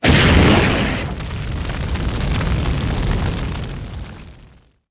firewall

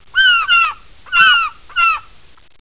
gull

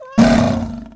howling; spirit handler

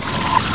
pinguicula damage

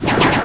attack; YOSTER E

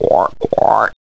frog

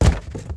blood butterfly die

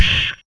pupa die

